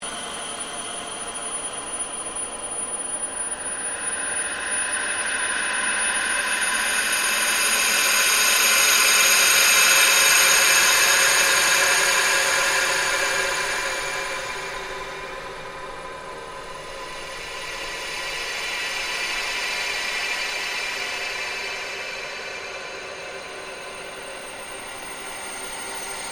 Ambient Sweep Very Slow, Drone; Sound effects created by manipulating other sounds; Sound Design

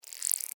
Insect Move Fast, Movement, Bug; Animal

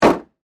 Ice Chest Lid Open, Restaurant; Miscellaneous Bar Sound Effects

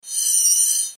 Slide Movement Multimedia; Blastwave

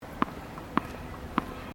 TENNIS BALL BOUNCE, Local tennis club; Sports

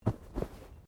Bodymovement Fall Light; Body movement foley to simulate falls and deaths; Wrestling And Struggling

